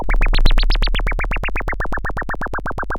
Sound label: Sound of a thunderstorm, center